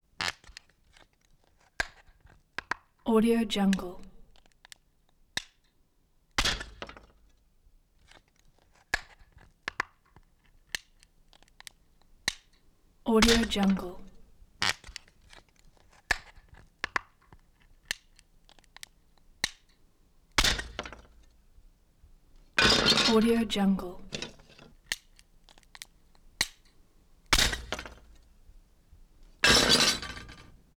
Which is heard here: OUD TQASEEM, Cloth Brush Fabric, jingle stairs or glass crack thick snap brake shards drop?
glass crack thick snap brake shards drop